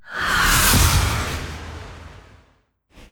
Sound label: Swoosh; Pandora